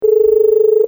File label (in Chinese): 电话 等待